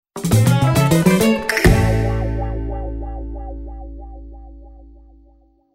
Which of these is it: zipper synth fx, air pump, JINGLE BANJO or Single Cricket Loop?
JINGLE BANJO